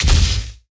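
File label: Sci weapon Barrier Blast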